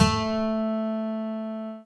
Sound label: lyra; theodyssey